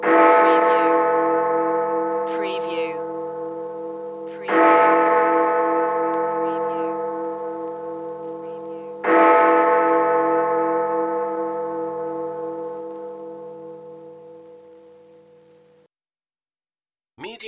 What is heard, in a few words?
Big Ben Strikes Three